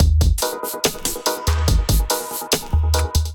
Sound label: Kit Melodic 143 bpm, Drums; MIDI Clips; Ableton; Chop and Swing